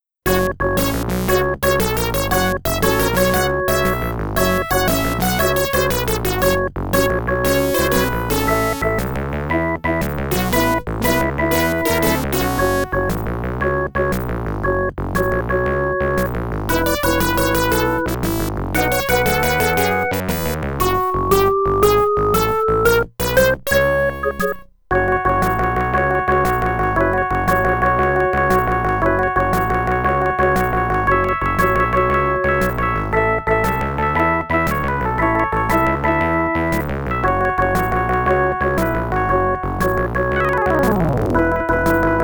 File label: AdLib; Oh No! More Lemmings; IBM PC